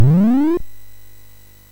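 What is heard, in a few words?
Petch, MOS 6581 SID chip music, games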